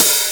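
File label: Roland TR-505 Hat Open; Drums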